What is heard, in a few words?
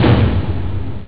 snare